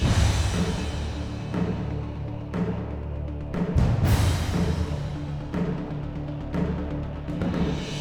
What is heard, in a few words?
Bell Tower, bell intro